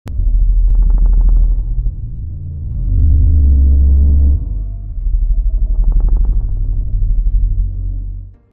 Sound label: t rex thumming; paleosound